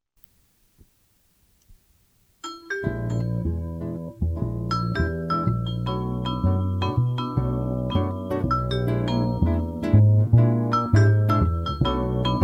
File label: Jazz Trio